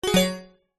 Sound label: game sound synth stab warm bright alert